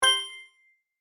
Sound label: Friendly digital ding app alert